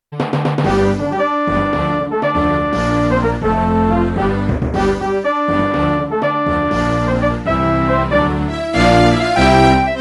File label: buggy music; Touche